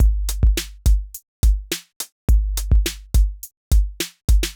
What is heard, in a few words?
beat